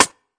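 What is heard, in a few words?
Snare, classic hip hop; STYLE KITS; Classic Hip Hop Mega Kit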